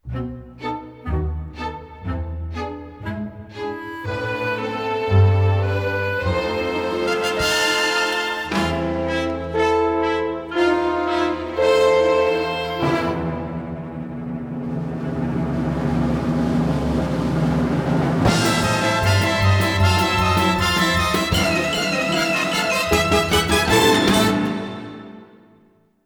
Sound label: SCENE CHANGE; Beauty and the Beast